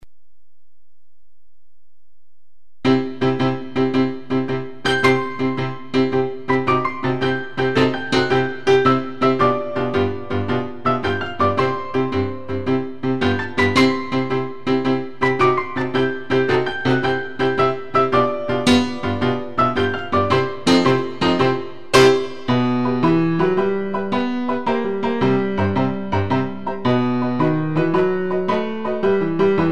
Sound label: Six-Eight 110; Seiber; Rumba Bis Walzer